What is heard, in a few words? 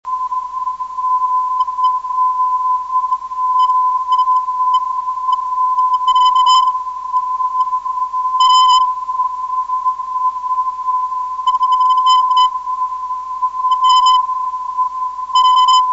385.5khz